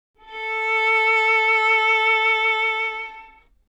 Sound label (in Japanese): 押弦によるヴィブラート, 擦弦; A4; 弦鳴楽器